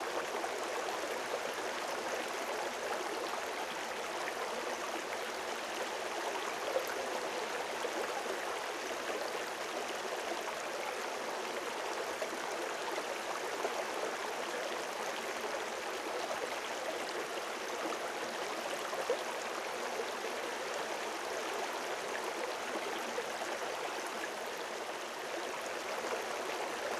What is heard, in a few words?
river med; Rust